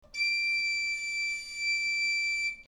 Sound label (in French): mib, galoubet; Note Mib - 4ème interligne